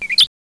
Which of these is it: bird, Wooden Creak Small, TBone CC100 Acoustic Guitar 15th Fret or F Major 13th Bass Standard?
bird